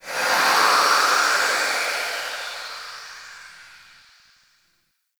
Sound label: Town Steam Release, Ambience; Warframe; Duviri